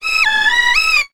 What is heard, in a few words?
Beat